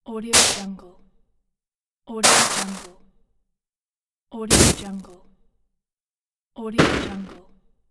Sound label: snow impacts